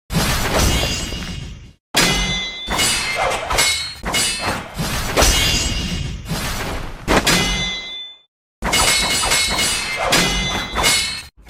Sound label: swords clash